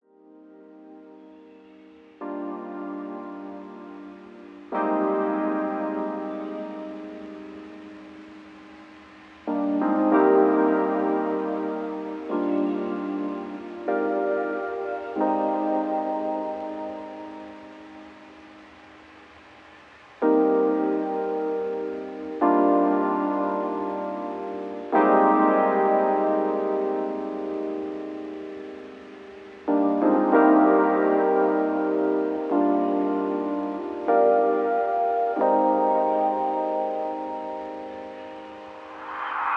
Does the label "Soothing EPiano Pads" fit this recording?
Yes